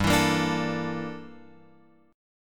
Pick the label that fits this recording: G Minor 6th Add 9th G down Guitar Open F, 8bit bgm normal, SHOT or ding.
G Minor 6th Add 9th G down Guitar Open F